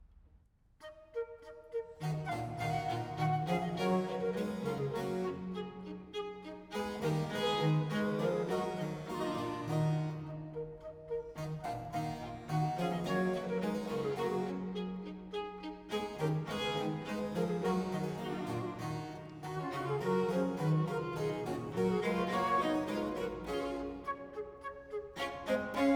Trio Sonata No. 3 in E Minor, HWV 398 VI. Gavotte – Allegro